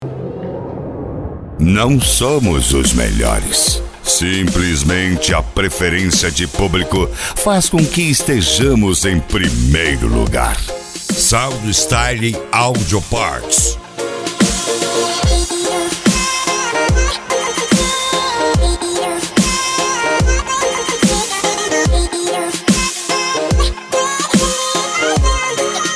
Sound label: sound style dubstep